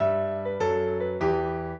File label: piano; Mozart